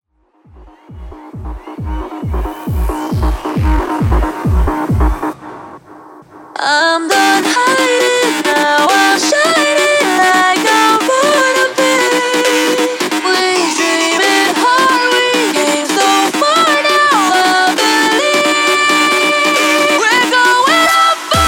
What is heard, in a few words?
Epic Mashup 135Bpm